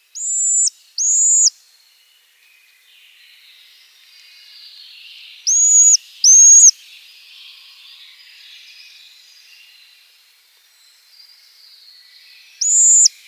Pygmy Owl female call

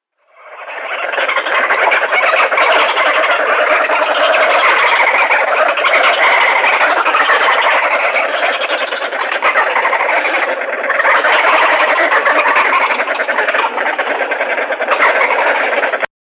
Marabou Stork

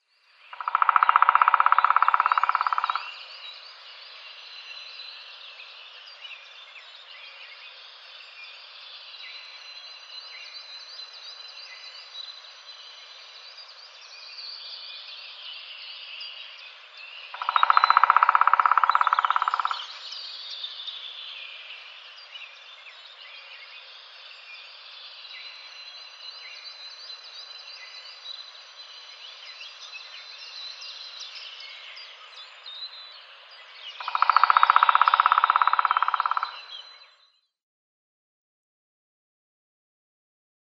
Black Woodpecker drumming

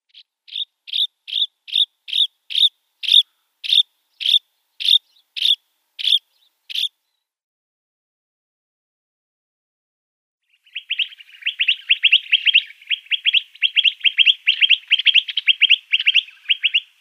Eastern Rock Nuthatch calls

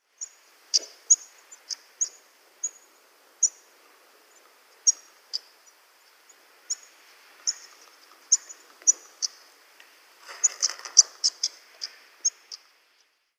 Canary Island Stonechat calls

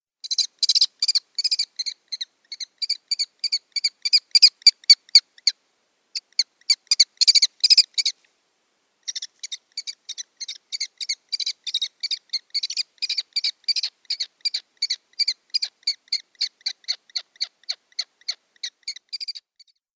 Eurasian Kestrel nestlings

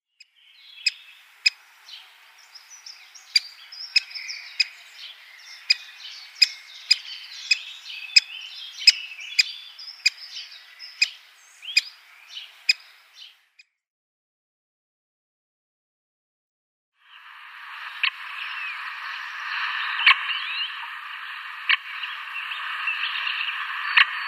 Lesser Spotted Woodpecker calls

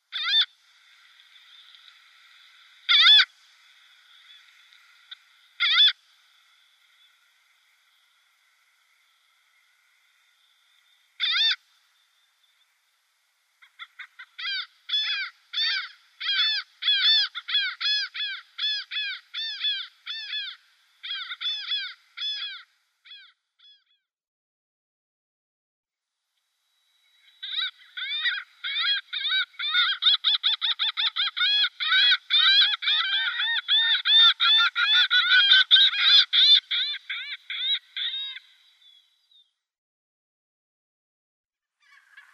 Laughing Gull

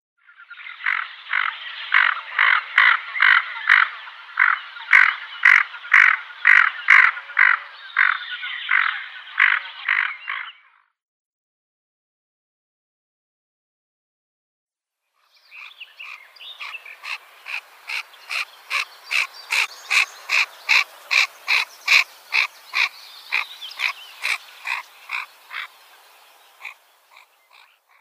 Common Goldeneye females

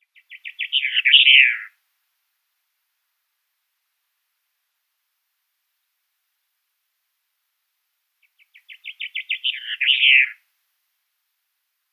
Blue Chaffinch song

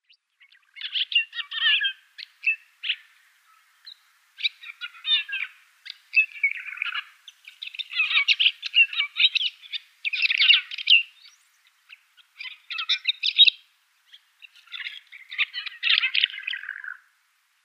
Banded Martin song

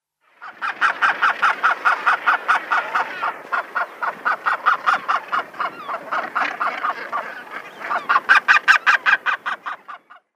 Northern Gannet nestling

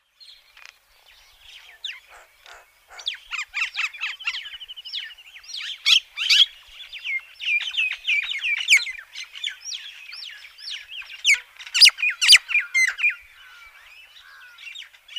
Common Myna song

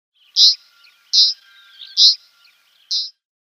Isabelline Wheatear calls

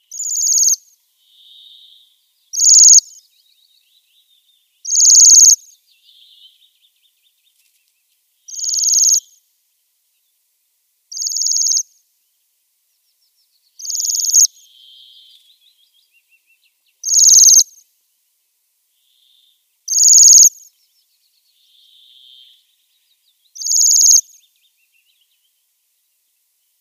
Bohemian Waxwing song

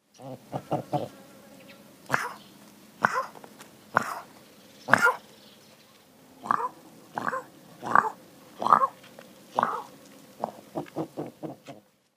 Houbara Bustard male call

